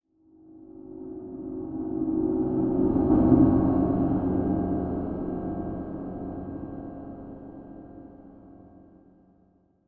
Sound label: Infinite Void Hit